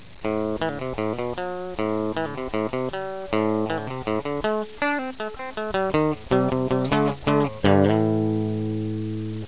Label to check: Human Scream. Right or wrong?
Wrong